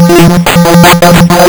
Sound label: lsdj waves; wavetables